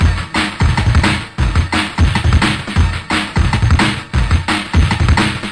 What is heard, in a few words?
way distorted tekstep, drum loops and breaks, 174 bpm